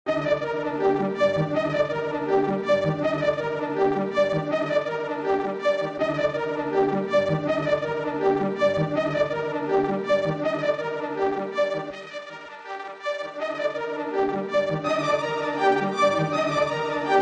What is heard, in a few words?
Lead Synths